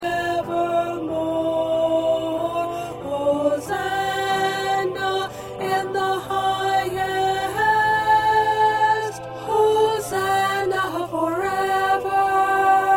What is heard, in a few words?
1st Alto